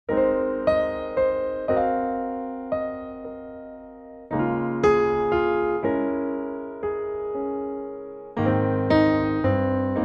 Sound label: Ab piano; Pianotrak; key - Ab - vocal range - F to Ab (optional Bb); intimate 2024 Pianotrak